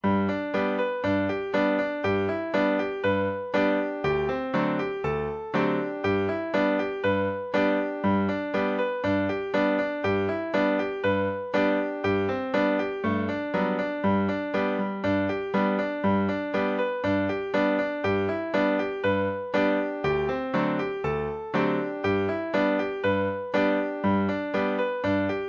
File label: buffalo gals banjo